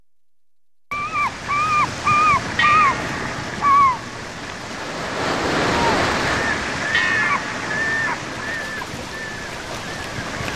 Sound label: ocean harbor